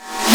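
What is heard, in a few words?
snare REV, drum; Breaks N Beats Sound Kit; Beatz Kit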